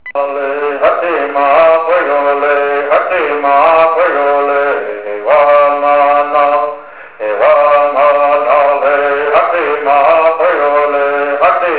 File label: Bird